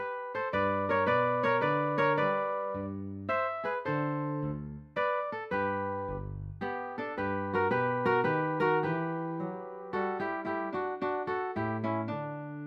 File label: Minuet G